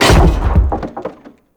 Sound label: Debris Metal Wood; Ambience; Gameplay; Warframe; Entrati